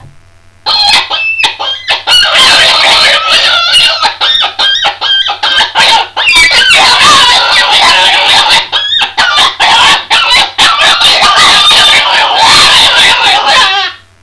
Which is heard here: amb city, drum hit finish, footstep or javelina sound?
javelina sound